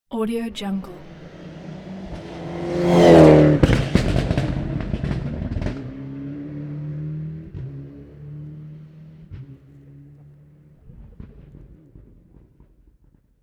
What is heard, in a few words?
racing car fast driveby; fxprosound